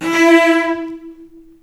E3 AT LNG L, VCS ARCO, String Collection; East West; The Ultimate String Collection

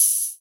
Rides, Cymbals

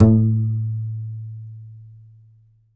C. Bass Balalaika, Plucked Strings

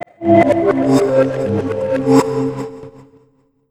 SREV PERC, REVERSE FX; USB Soundscan vol.60; Asian Traditions; AKAI

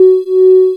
PAD; Sound & Vision; Gigapack I CD 2; PAD SYN-PADs; PAD Micro-Pads

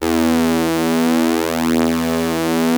OB E3, Rare Synthesizer; Hollywood Edge - Giorgio Moroder Rare Synthesizer Collection; OB 4 VOX.

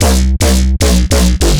bass loop turnaround 150 E; Virtual Riot

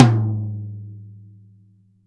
TOM, Drum Dry; East Collexion; Drum 1

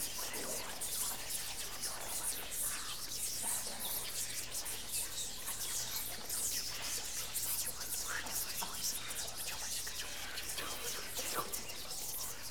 WHISPER; WHISPERS

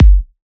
Kick; M8; Electronisounds

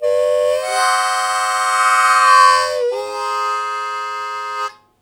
Blues Chord Attack Ab1, Harmonica; Zero G - Harmonica

